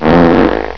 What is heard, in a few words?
sfsmg power up, weapons; cso